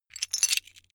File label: metal pieces clatter short